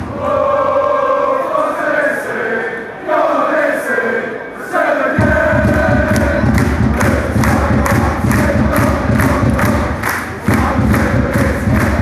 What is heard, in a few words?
oooh forza, fanchants; krc